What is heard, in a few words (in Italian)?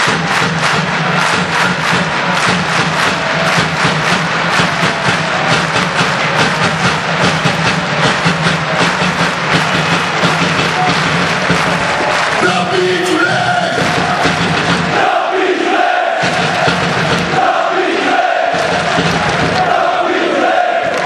Treno; RAPID; Treno RAPID 19 octombrie 2006